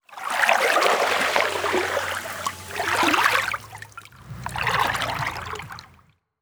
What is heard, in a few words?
environment water calm